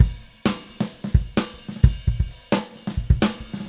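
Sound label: 130 bpm, beat loops; Zero-G; Monster Beats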